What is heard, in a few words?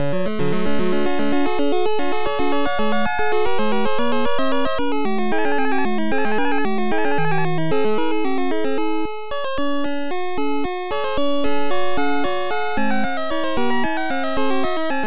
Lap Clear; Ghosts n Goblins; NES